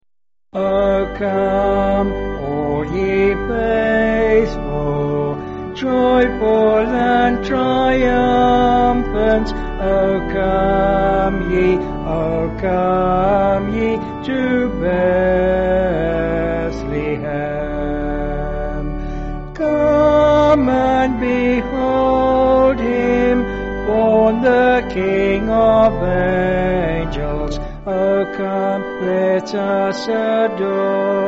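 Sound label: O Come All Ye Faithful, Piano, Band, Pipe Organ; 4/G Vocals and Organ; Sung Lyrics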